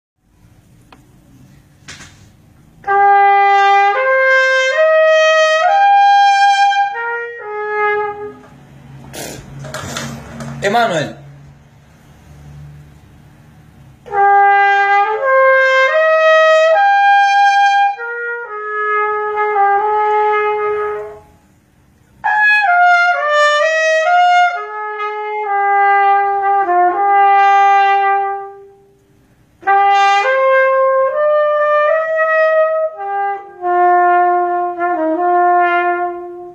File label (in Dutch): Trompet